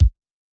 Hiphop kick; Reverb+EQ+Comp+Limit

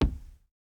kick, house

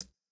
Jrock hihat

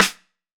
90s hiphop snare; Reference beat bpm90

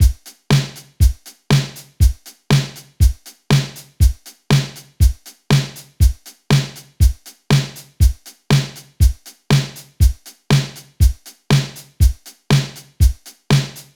pop loop 120; japanese pop

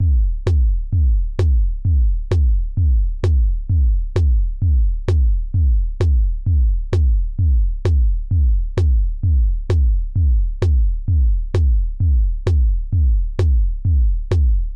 Korg loop 130

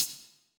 hihat; Electro; futurefunk

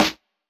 hiphop snare, Effect on

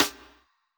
Hiphop snare; Dry original

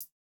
no effect j city pop 1980 hihat

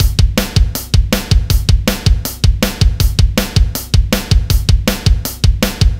Rock loop; Reference Beat

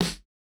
snare, snare house

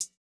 hihat, house

